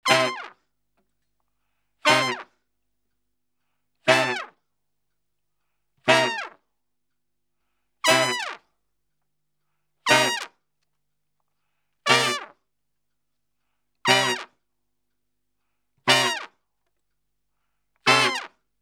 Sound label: Salsa Picante; Masterbits; World Zone VOL-3